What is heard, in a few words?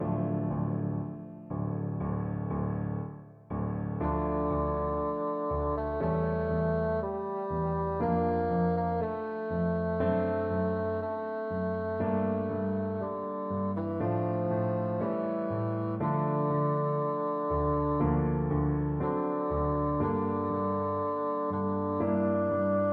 libera me, bassoon